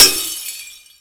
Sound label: Glass Impact; Warframe